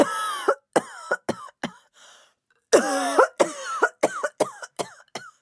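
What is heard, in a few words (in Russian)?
удушливый навязчивый женский кашель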